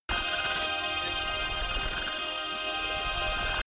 promo pad

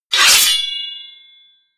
scrape

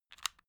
stick lighter button extinguish

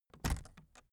aluminium case put down

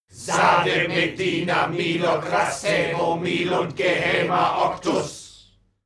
male crowd evoke

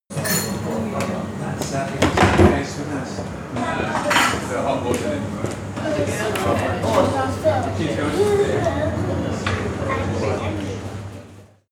restaurant crowd wallah service coffee shop

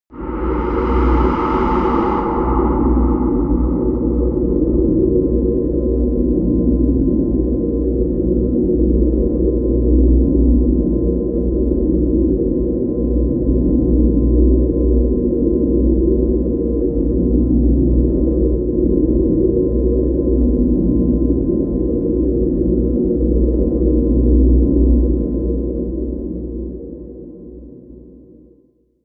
drone catacombs

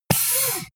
pneumatic close

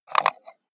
computer mouse wheel scroll fast

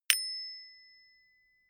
bicycle bell hit simple

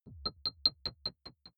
button echo distorted

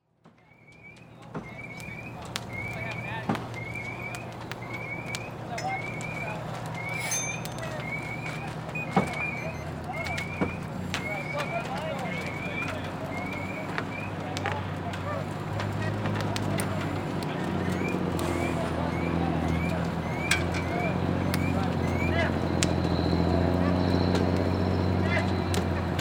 large fire ambience

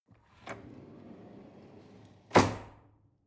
drawer cutlery open draw

